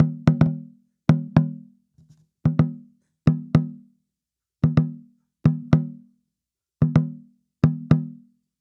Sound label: Tambora, African; Sonic Foundry (Sony Creative Software); Continental Drift Aftershocks